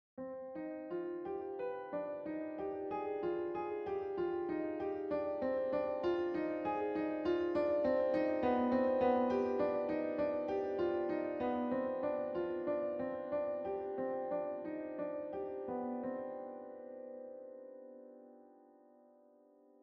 Piano

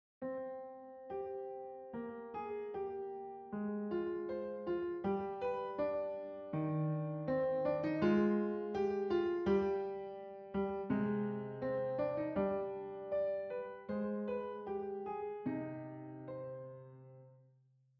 Piano

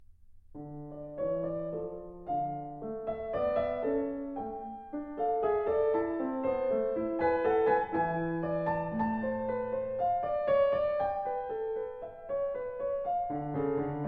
Sinfonia in d-moll; Bach; BWV 790

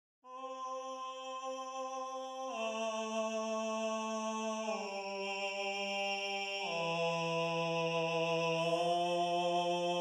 Bass

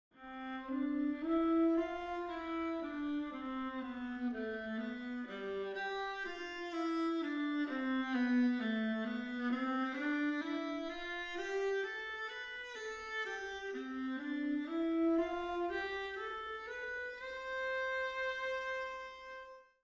Viola

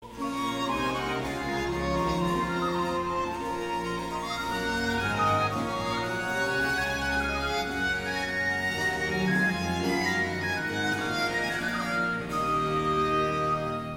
Ritornello; Monteverdi; L Orfeo; Early italian opera

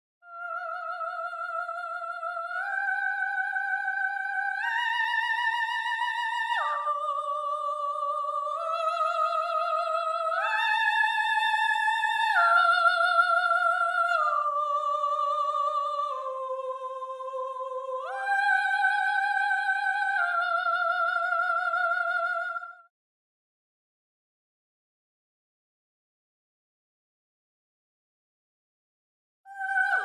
Soprano

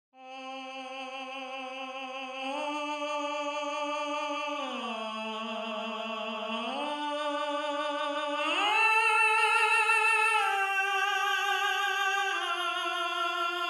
Tenor, counterpoint

